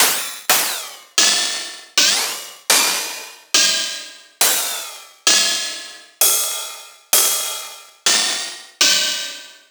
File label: 707 mod Ride